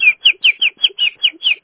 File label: birds, chirps